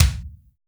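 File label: GRUMBLE KICK; POP-ROCK1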